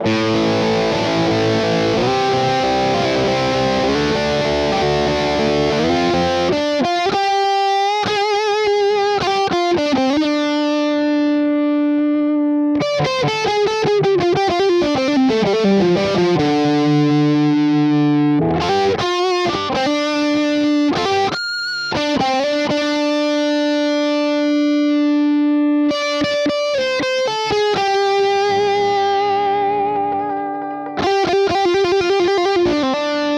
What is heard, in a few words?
Bridge Lead; Cort KX-500